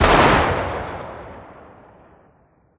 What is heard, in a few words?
deagle distant; weapons; Counter Strike Model Pack; CS2